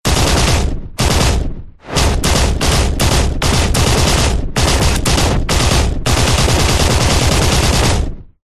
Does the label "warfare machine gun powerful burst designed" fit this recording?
Yes